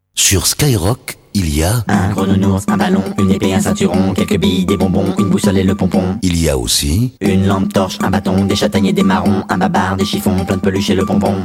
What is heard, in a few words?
jingle; dorval; supernana